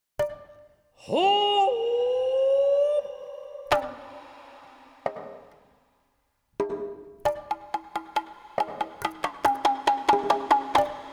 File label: Meditation